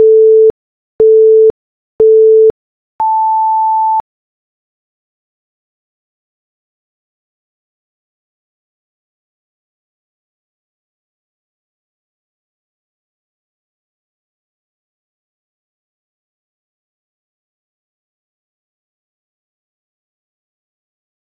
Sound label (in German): zirkeltraining Timer